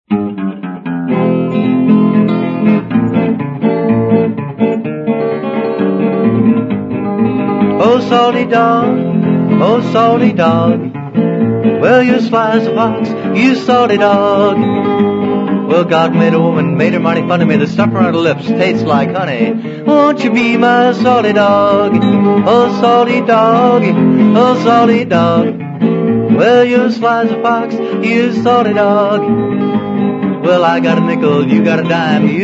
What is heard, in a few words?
Salty Dog Blues